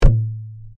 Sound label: btn sound